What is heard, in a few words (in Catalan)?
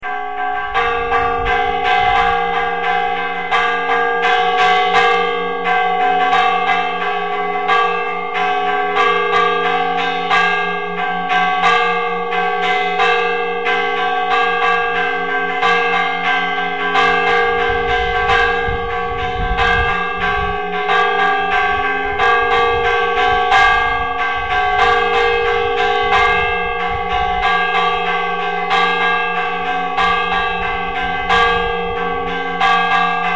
campana